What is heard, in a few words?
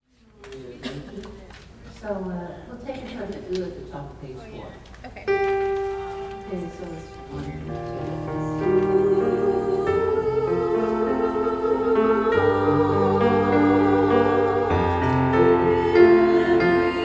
alto